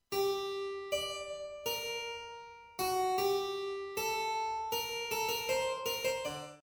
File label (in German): Fuge g-moll Thema; Fuge 2